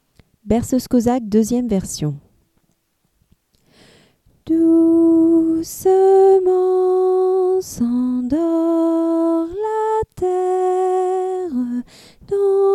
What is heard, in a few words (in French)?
berceuse cosaque version soprano